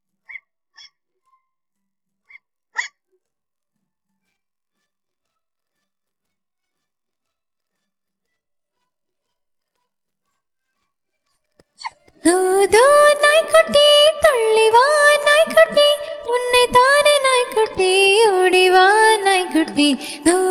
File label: Tamil Rhymes for Kids, vocals; Dho Dho Naikutti